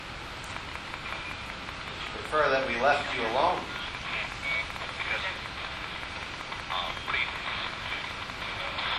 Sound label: Ghost Box